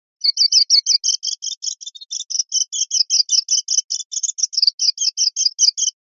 rep cernicaleos, pardillo

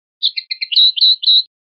pinzonada, pardillo

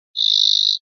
rep ranadas, pardillo

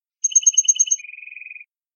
acanariado

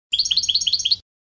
tableteos, campero

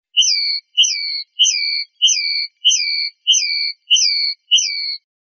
rep golpes

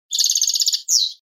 rechio; campero

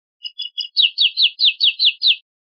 pitadas, pardillo

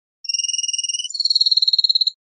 rep acanariado, pardillo; linked files test pardillo

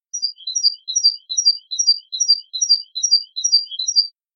mecida

